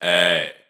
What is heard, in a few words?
ay, ooh ahh, Voice